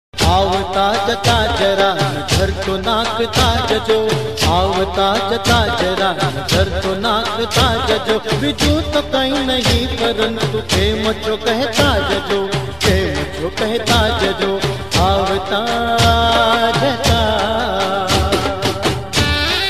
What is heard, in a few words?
navratri ringtone garba ringtone